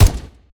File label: hk416 fire; STALZONE